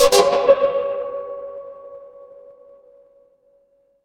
fire electric arc

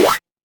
retro game power up

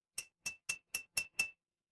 glass glass tap

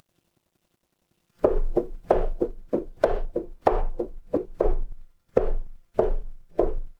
footsteps stairs

